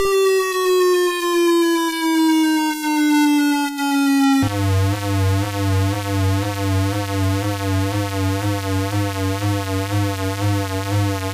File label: Siren; BTDD NES